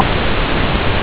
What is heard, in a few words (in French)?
bruit